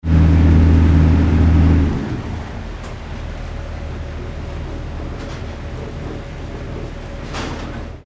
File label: boat barge stopping